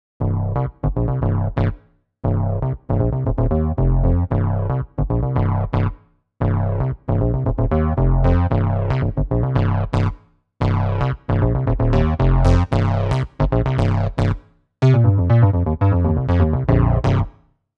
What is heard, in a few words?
Synth Bass; VIVO S10